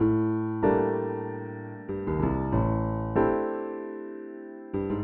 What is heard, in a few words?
Jazz Keys Ac Piano 95 A, Chordy Loops, 95bpm